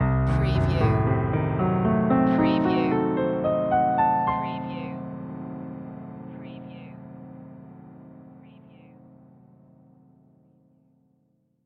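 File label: Production Element Piano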